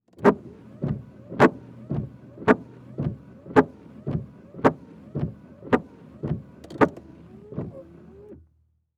Truck Ford Ranger Run Windshield Wipers High Speed; transportation